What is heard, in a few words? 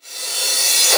Cymbals Reverse; Vengeance Samples